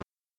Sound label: Instrument